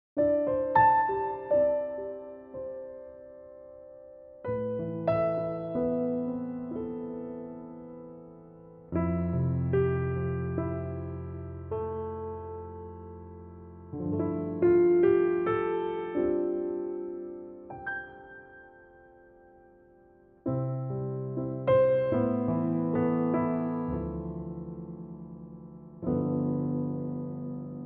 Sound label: C piano